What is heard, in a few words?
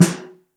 Snare Mono